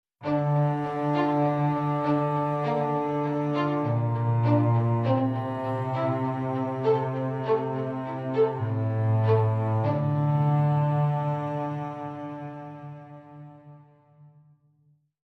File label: Strings